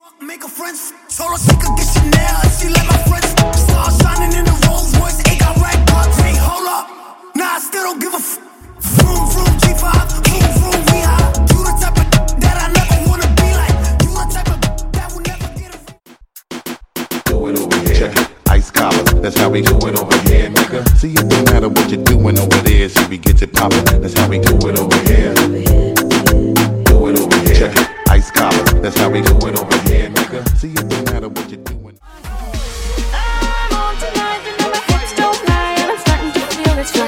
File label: Moombahton Pack; Remixfreakz